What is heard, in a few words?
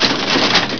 LEVER BIG, MACHINES